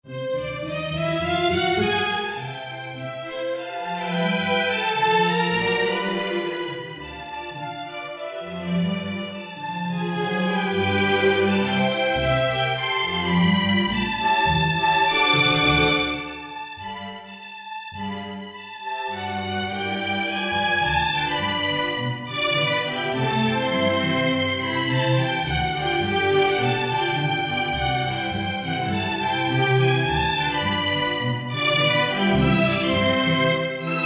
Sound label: string orchester